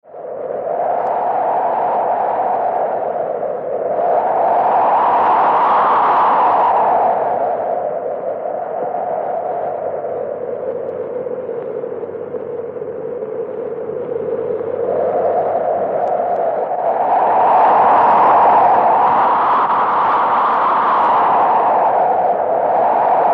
WEATHER - WIND EERIE: All purpose wind at half speed.